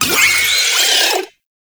Suicide Scuttler Pre Death Vocal; Enemies; Gameplay; Boss; Warframe; Oraxia

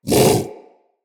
Vocal Bark, Hunting Critters; Characters; Predasite

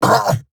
Pain, Battle Barks; Warframe; Eidolon Grineer

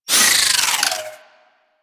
Turret Throw Whoosh; Warframe; Corpus; Corpus Railjack; Admiral Agent

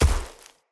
Step Veg, Footsteps; Enemy; Corrupted Sproutfolk

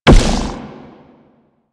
Corruption Pickup Large Spawn; Warframe; Missions; Zariman

